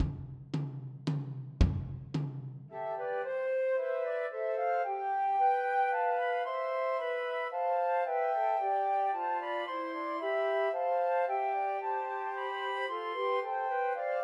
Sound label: Trios for Three Flutes; The First Nowell; Christmas